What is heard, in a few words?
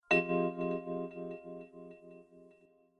sms